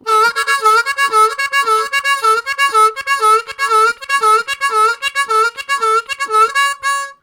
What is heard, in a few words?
Harmonica, WAV Riffs; Zero G